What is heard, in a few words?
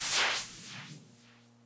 force flippity Flip